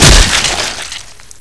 Gib Body; Physics

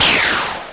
teleport in

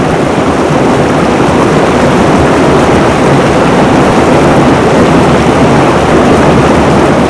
Waterfall close

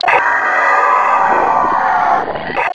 zombine charge; scmod

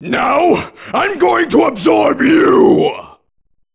super candy eat; buu

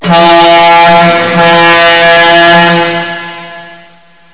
train horn

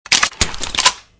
reload mp40

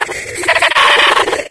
alert, stalker; scmod

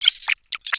deploy, Snark; Half-Life

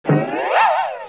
attack, headcrab

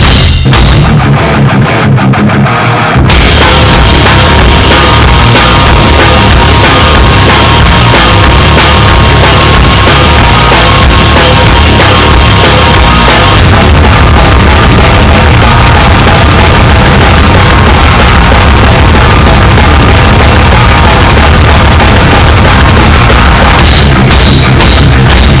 metal

